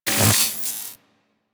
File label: Key Stop; Enemies; Warframe; Captain Vor